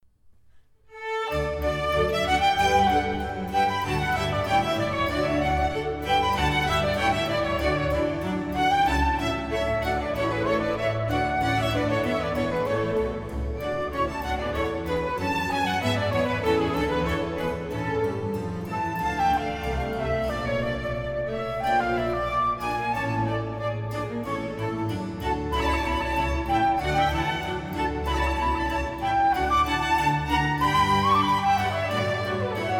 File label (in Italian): Concerto Per Flauto Allegro; padre giuseppe paolucci